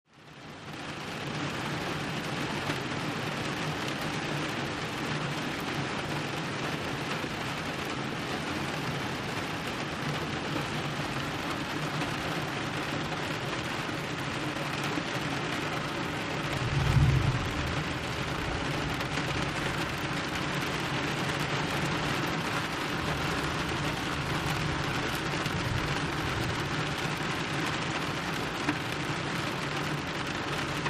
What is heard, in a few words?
Rain Steady Heavy Rain